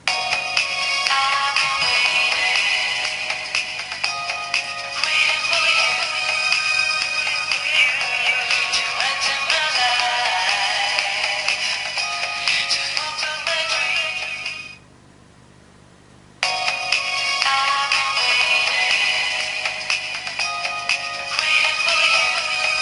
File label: nokia1200 waiting ringtone